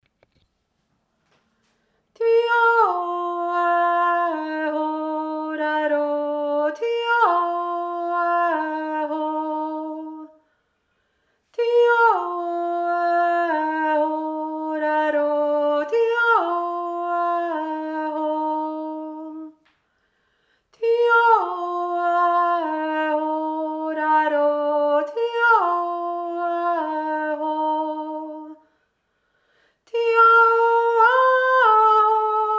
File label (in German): feenjodler erste stimme